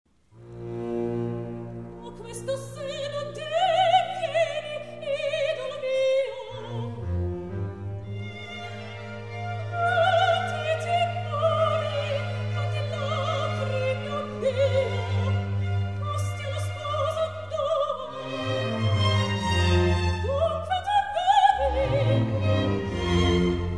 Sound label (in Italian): mozart rezitativo